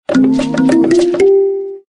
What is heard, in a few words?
win; Flame Dancer